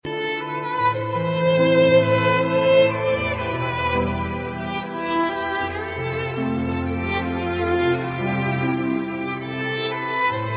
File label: heart touching sad ringtone